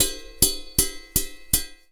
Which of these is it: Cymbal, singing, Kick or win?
Cymbal